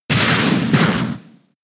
Vacuum Wave; Pokemon; gen 6 attack moves; XY ORAS

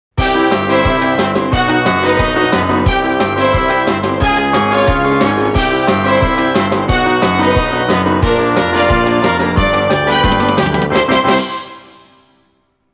Variation, Instrumental; max payne 2 original soundtrack game rip

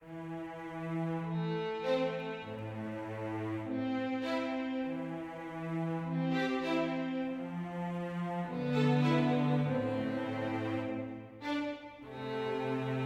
mysterious med

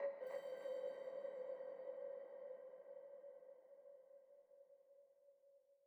Env Field Night Cave; the legend of zelda tears of the kingdom

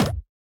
Cat hit Bump; Super Mombo Quest

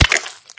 Die creature

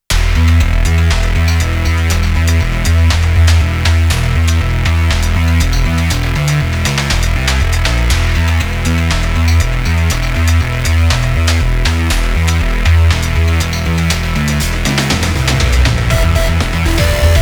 Space Battle (Instrumental)

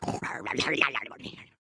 Pirate Sleep; Cut the Rope: Time Travel

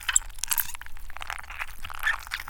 Flesh Move Slow

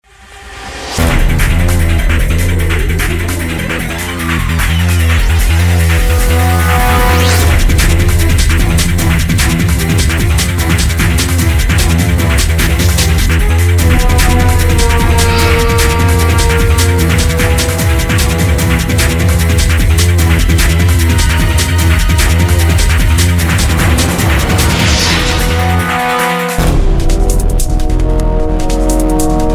Combat Intro; darkstar one; windows xbox 360

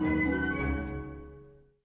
Neutral End 60bpm; Music Dialogue

